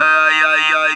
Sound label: TB AYAYA, Talk Box